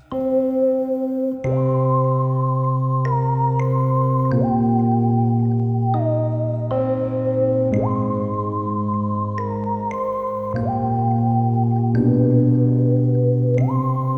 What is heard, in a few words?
Spooky Solo; Solos